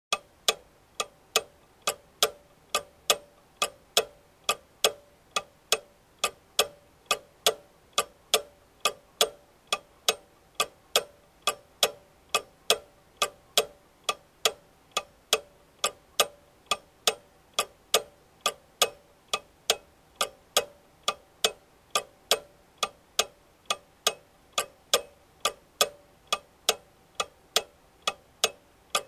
Wall clock ticking with weights and pendulum, mechanism winding; watches